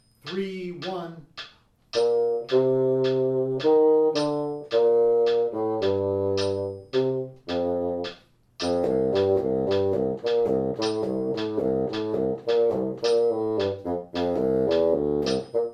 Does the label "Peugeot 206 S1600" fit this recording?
No